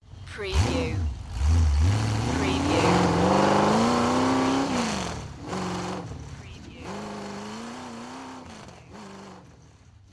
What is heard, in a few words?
Chevy V8 Hot Rod Start Accelerate Drive Away